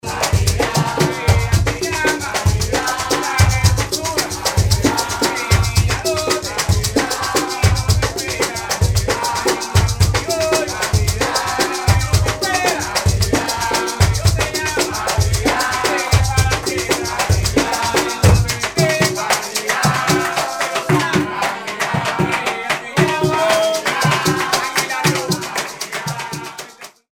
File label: vodoo fiesta in cuba